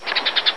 kill heron; catalane2k8

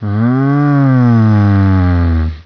zombie; de compclub

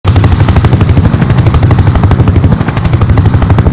ac hover

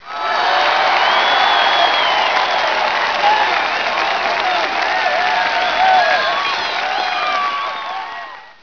big cheer

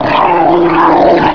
attack; hungerhound

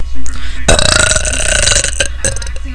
burp

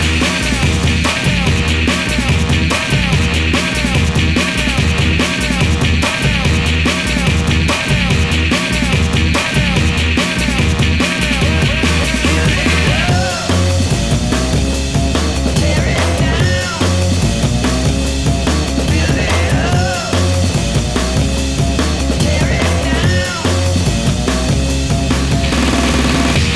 theme

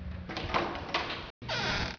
tab door

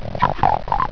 roach

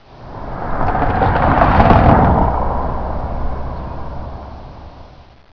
overpass

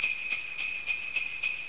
bells

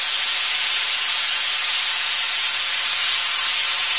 steam loop; hydrophobia

